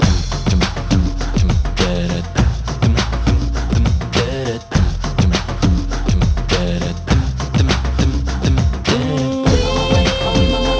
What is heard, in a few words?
Melody King Lion Jungle